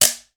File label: GUIRO HI HIT; ILIO; Synclavier Percussion 2; World & Orchestral